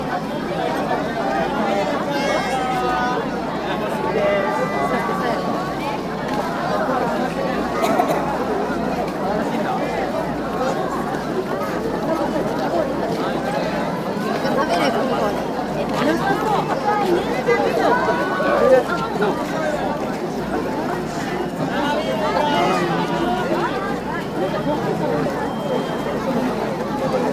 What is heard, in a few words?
Japanese festival